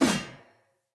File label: Sphere Polearm Whoosh; Weapons; Player